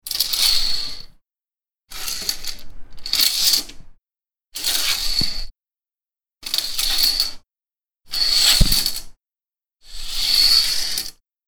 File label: curtain